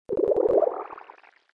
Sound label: tone